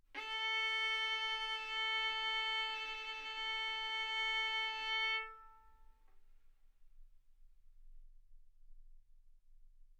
Violin arco A4 B4; mf; sul A; stereo

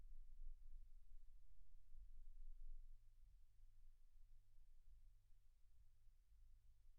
silence 48kHz

